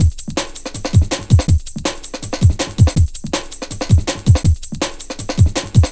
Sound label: 162, breaks; M8